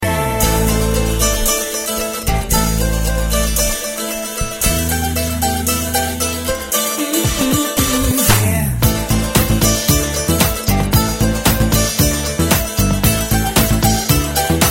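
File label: instrumental, dance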